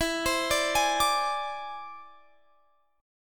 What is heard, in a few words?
E Augmented 7th E down Guitar Drop D